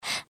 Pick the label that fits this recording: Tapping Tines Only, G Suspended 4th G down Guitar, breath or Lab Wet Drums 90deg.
breath